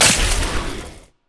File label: spider attack; LoL